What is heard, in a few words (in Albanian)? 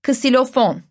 ksilofon